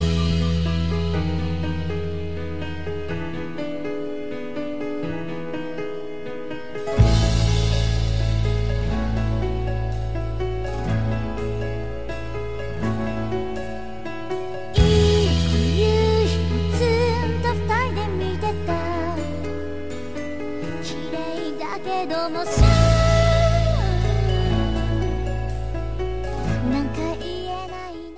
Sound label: Instrumental